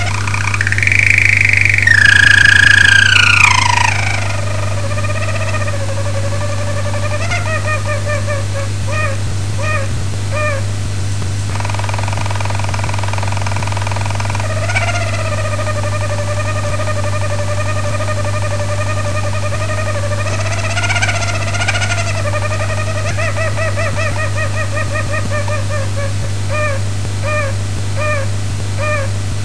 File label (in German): vogel